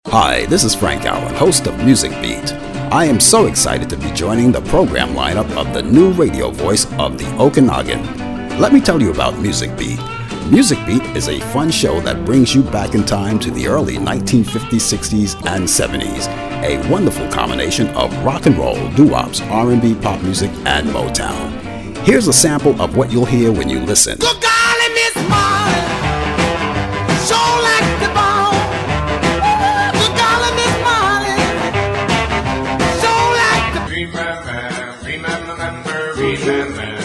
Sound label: Music Beat Promo, Radio; Okanagan Valley Radio